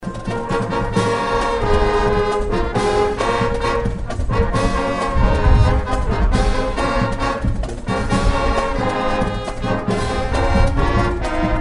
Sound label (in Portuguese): MÚSICA INSTRUMENTAL; SEMANA DA PÁTRIA